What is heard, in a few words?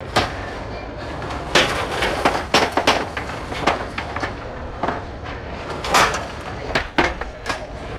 heavy metallic clanging and house demolition; destruction